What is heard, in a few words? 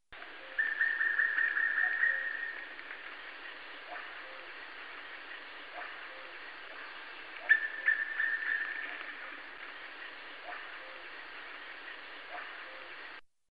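oriental cuckoo voice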